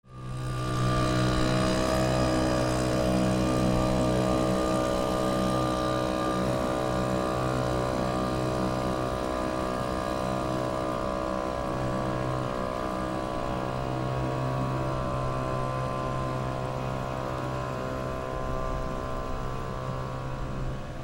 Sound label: BOATS